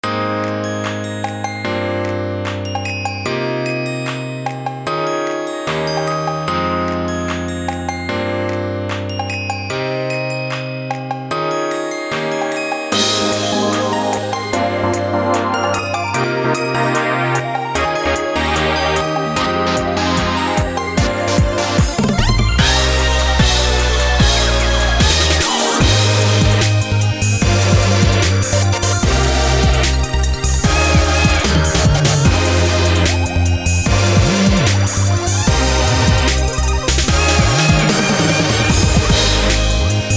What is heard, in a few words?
raindrops -Future Bass Mix-; Z-I-v Battle Royale 2020